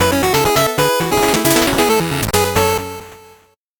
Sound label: 8bit jingle fast